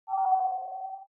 ui classic game start dull

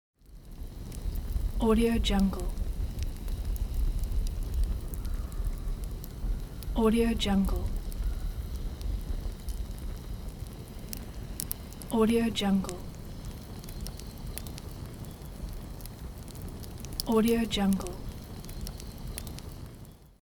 fire; pure-noise

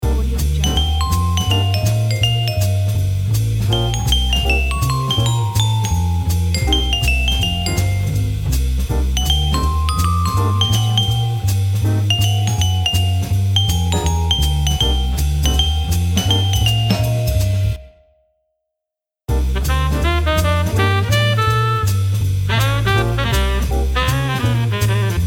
upbeat jazz noir club ident

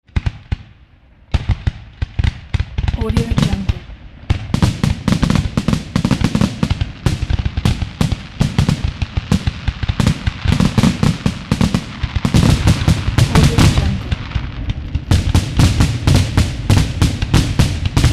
fireworks grand finale; fascinatedsound

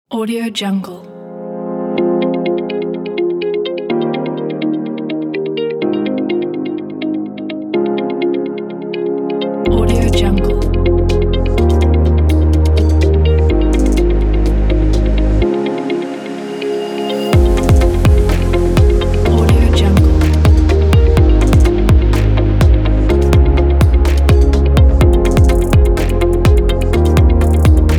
abstract future technology corporate

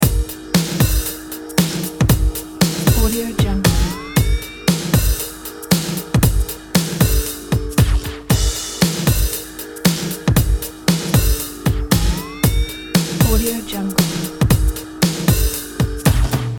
groove drum loop

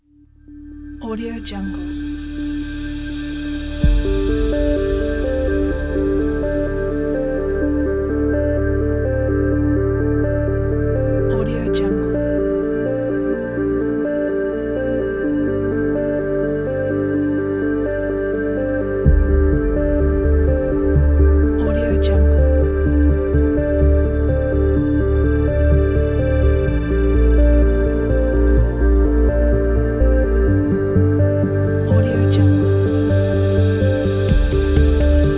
the documentaries; airtwist

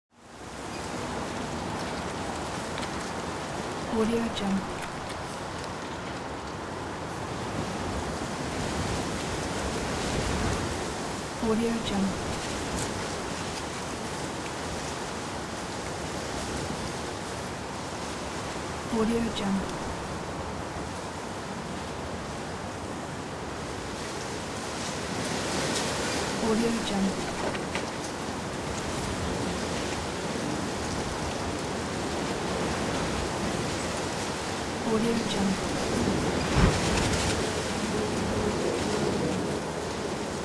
storm wind; pure-noise

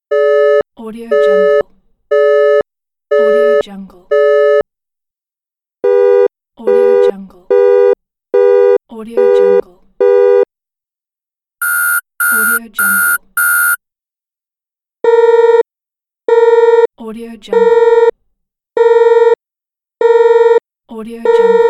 busy tones

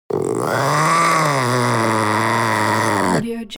animal panther young snarl breathe; smartsoundfx

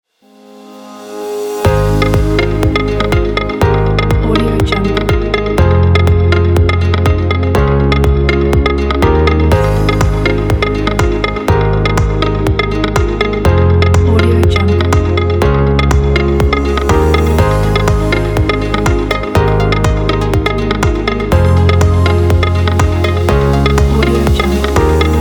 uplifting simple dynamic

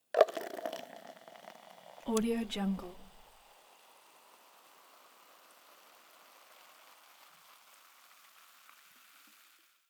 pouring a soft drink into a plastic cup; stormwaveaudio

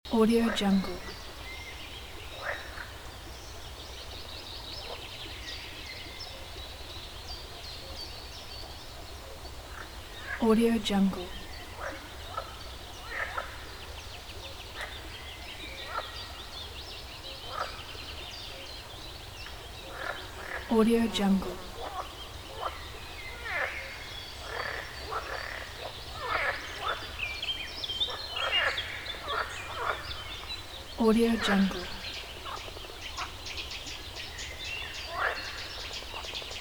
forest birds with frogs and distant river

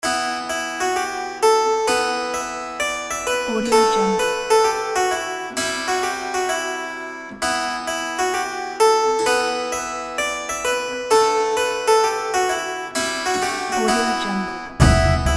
magical medieval music

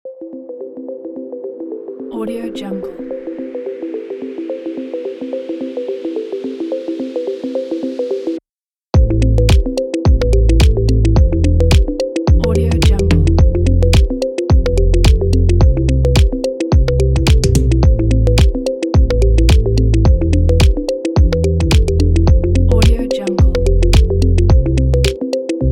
beat future

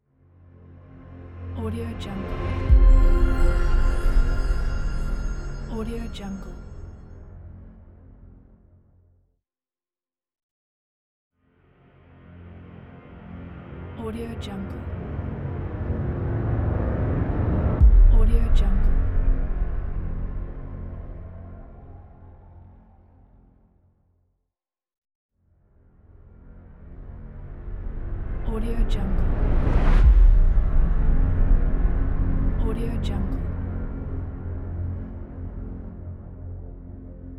rising tension

